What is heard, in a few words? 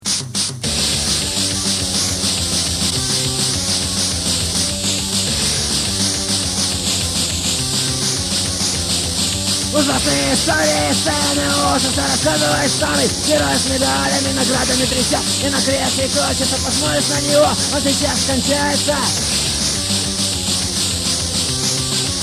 horror